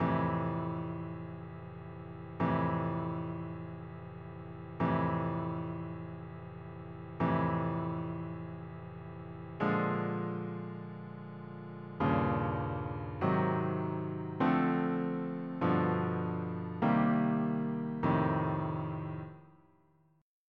rhythm changes F7

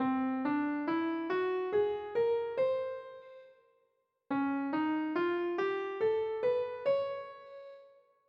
whole tone scale

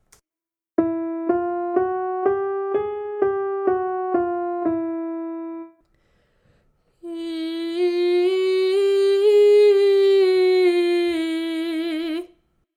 vocal exercises

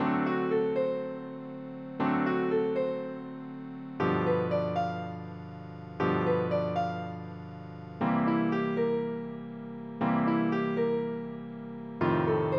rhythm changes B digital

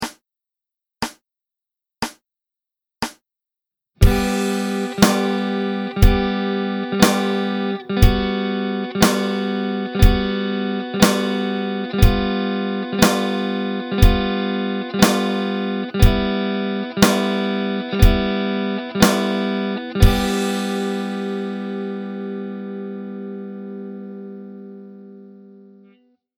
one finger chord exercise

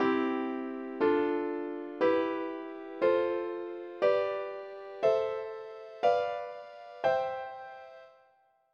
Major Scale Chords

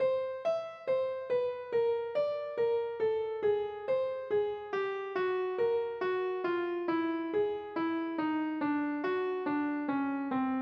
whole tone

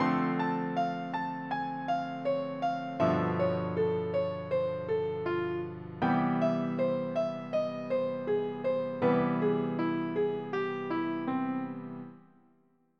augmented ex; The Augmented Scale

